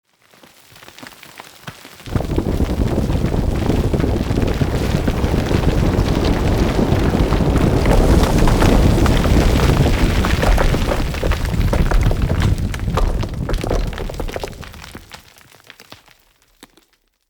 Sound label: Under City Cave Collapse